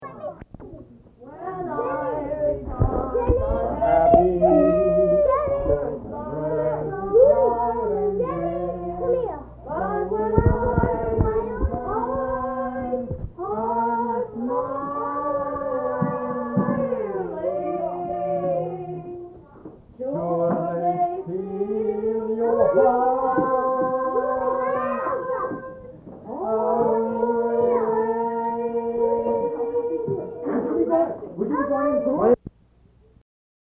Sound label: Family singing